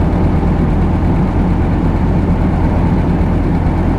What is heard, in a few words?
tank engine rpm 00; engines; War Thunder